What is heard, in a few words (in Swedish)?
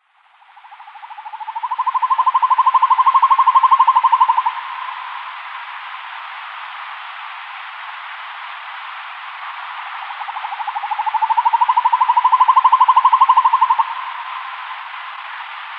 Hokuggla, Faglar